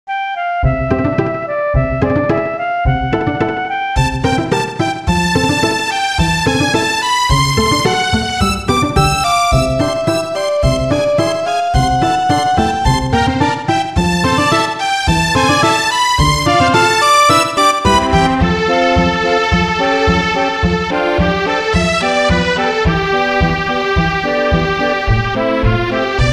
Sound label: 16bit Loop